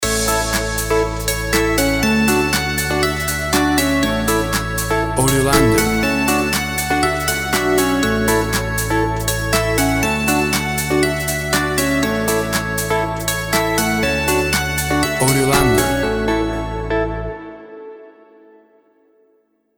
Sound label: Upbeat Groove House